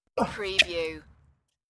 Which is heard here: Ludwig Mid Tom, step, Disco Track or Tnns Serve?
Tnns Serve